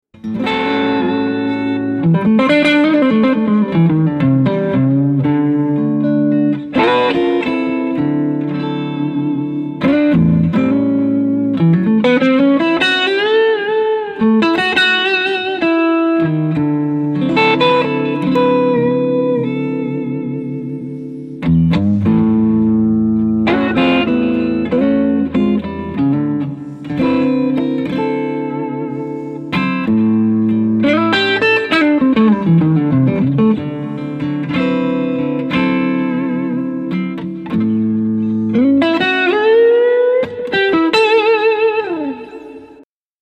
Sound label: mild distortion blues